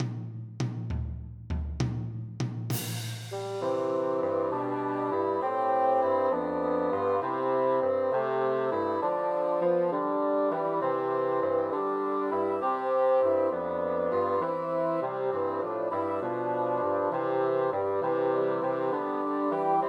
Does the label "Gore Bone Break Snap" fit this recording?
No